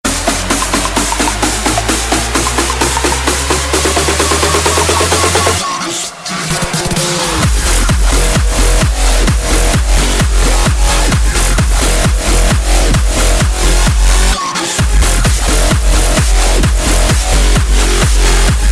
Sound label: DUBSTEP Remix